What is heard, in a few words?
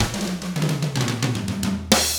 110 SMBA samba; ILIO; Double Platinum Drums 1